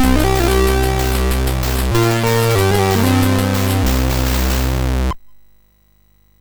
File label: Ryshka, sid MOS6581R2; GAMES